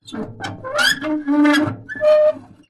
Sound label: industrial lever pump metal old handle pumping single